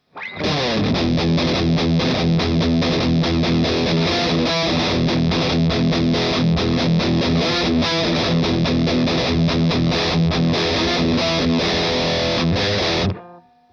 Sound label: heavy